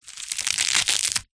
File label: foley leaves flower scrunch tear